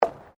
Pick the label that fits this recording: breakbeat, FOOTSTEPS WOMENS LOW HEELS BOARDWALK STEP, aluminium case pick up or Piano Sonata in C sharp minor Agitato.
FOOTSTEPS WOMENS LOW HEELS BOARDWALK STEP